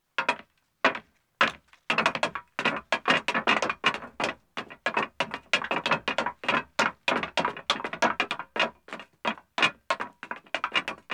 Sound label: Metal Stairs Ascending, 2 Men, Footsteps; BBC Sound Effects Library; Footsteps II